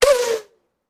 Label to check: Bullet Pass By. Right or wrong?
Right